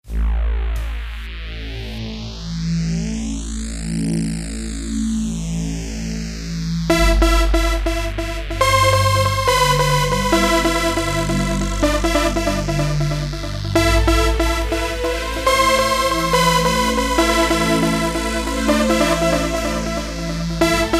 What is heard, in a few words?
casio vz-1 pure sawth